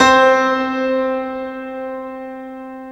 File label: UP GRAND, PIANOS; SoundFactory